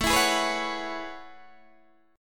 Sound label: A Major 13th, Guitar DADGAD